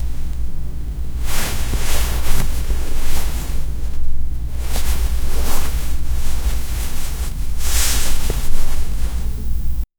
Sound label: sock toe gently caressing, textures